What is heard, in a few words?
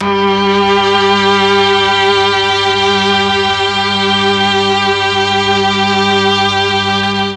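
G2 MIXED STRINGS, Mellotron; Mike Pinder - Mellotron Archive CD; MIXED STRGS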